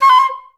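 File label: flutes C5; Best Service; Peter Siedlaczek's Advanced Orchestra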